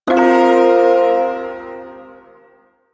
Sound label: Shawzin Pent Min Chord; Warframe; Duviri Ersatz Shawzin